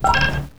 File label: button down; portal